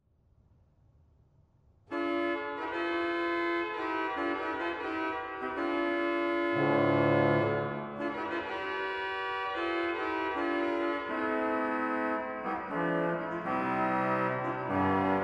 Trompete 8, organ; dortmund; Sauer

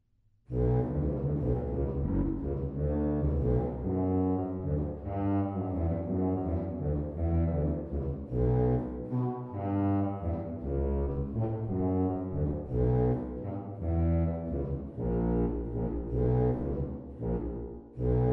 Posaun 16, organ; stade

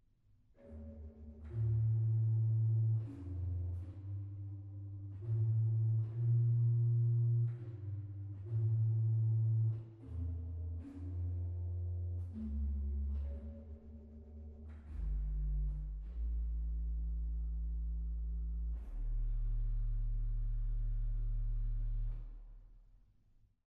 Subbass 16, organ; stade

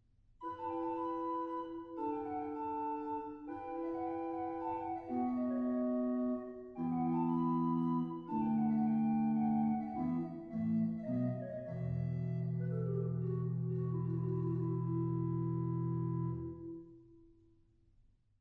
Rohrfloet 8, organ; stade

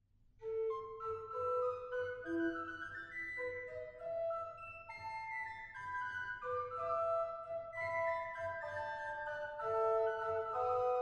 Waldfloet 2, organ; stade

